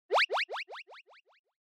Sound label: Anime Motion Delay